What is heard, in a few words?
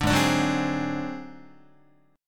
G sharp 7th Flat 5th G sharp down Guitar, chord; G#7b5; strummed; JGuitar